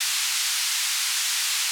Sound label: spinner spin; osu!; Luminance - Fluorescence